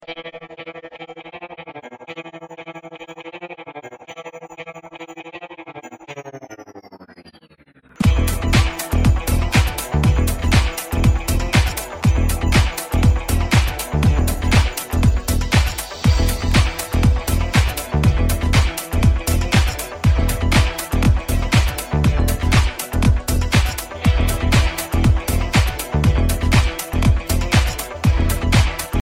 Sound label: funky mix; don 2006